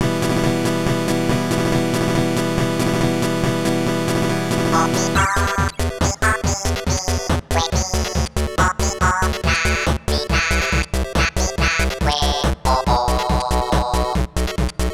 Flamenco NES Remix

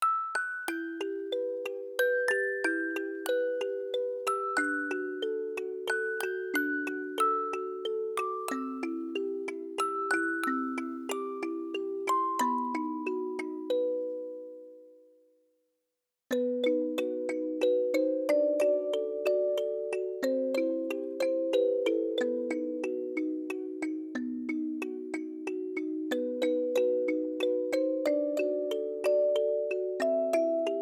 Flamenco Music Box

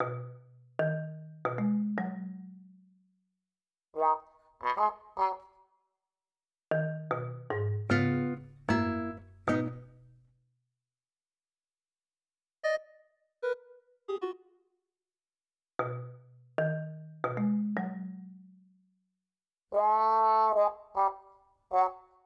3 AM, Rainy

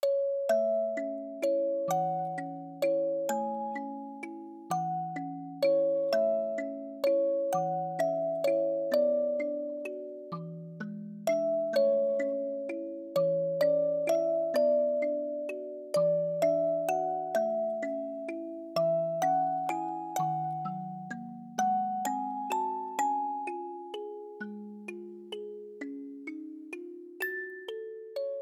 Music Box

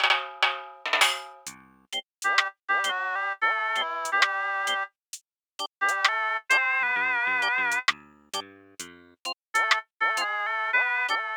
Reggae, Aircheck, Cheap

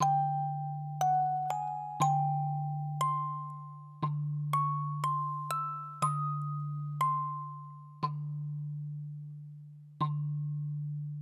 Music Box

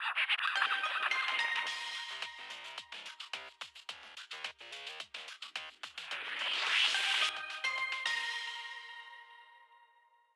Radio Jingle, Game music

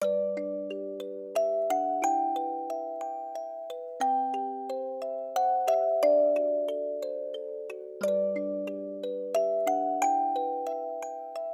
Music Box

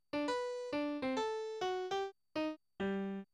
Ebony Piano

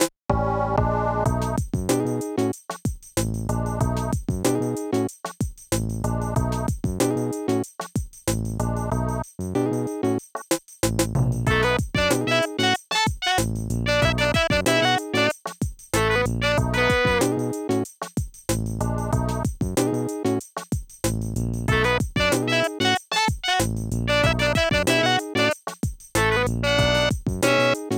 Groove Aircheck Retro

